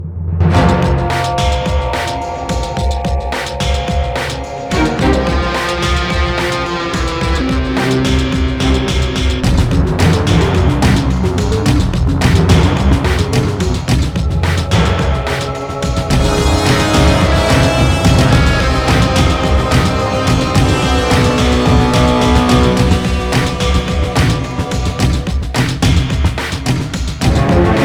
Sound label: Boss music; Ben 10 Protector of Earth; Wii; Bossmusict